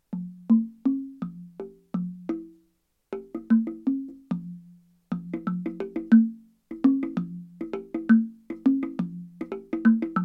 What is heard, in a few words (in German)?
zungentrommel